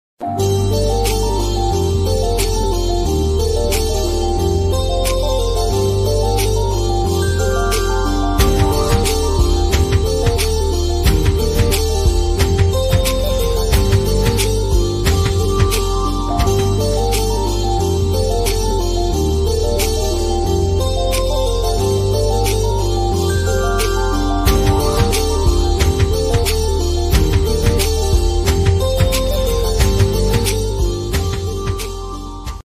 bollywood song ringtone instrumental south ringtone bgm instrumental ringtone